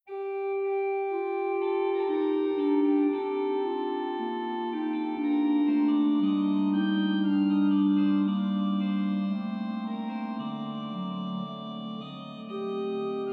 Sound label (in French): Voix humaine, organ; Organteq